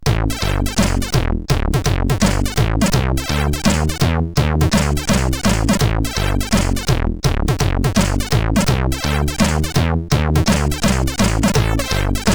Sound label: Piano Rap, CSG8580R5; sid